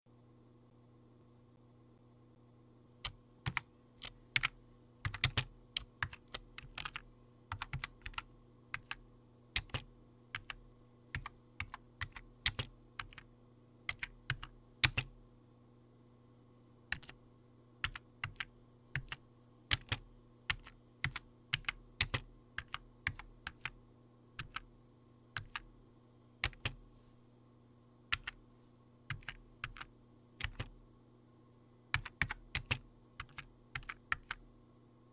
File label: typing hunt & peck